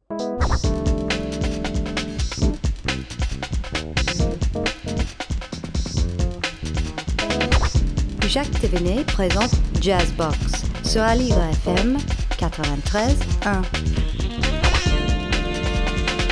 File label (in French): Jazz box; Générique